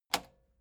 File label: metal button spring click fast